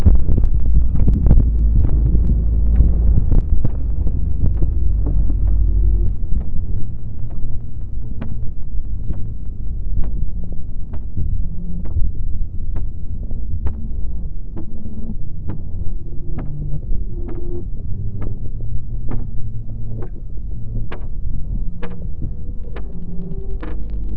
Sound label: Music Bomb Room